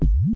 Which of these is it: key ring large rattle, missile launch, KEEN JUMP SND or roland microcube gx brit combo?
KEEN JUMP SND